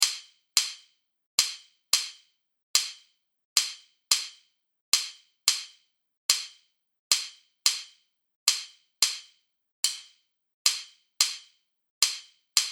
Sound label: clave